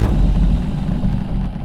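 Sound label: Music Shield Outro 145; From Dust; PS3; Xbox 360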